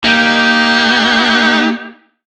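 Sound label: Shawzin Pentatonic Minor Chord; Warframe; Lizzie Shawzin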